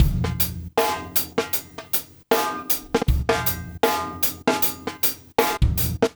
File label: 078 Chill Pop Drums; Pop Rock; Slow Tempo; Digidesign; Pro Tools; Pro Tools Essential Loops